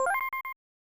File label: Selection Chime; rushing beat syura; snes